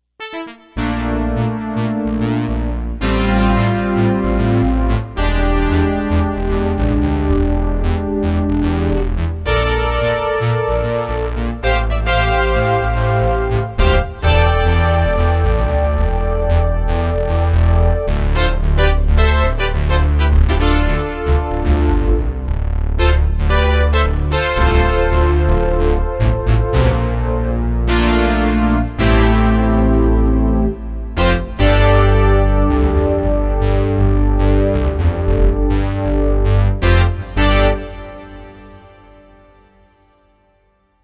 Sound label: p5 brass; dss1 demos